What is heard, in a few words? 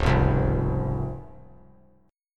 C sharp Major 7th; strummed; chord; 1,0,3,3